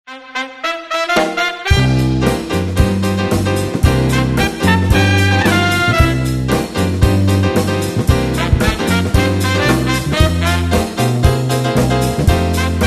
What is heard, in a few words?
welikefunkyjazz